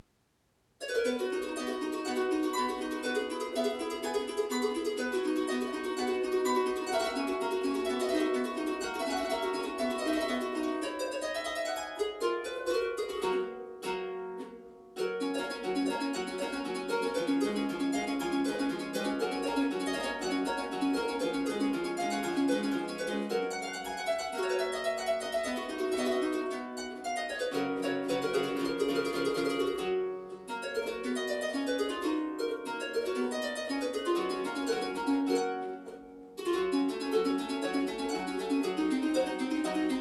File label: harpsichord